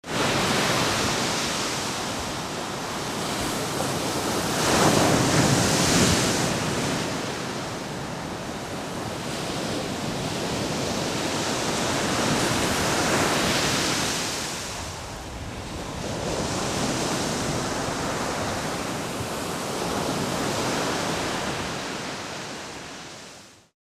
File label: Sea Waves; Sea Waves 2